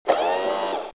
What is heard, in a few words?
walk, protocol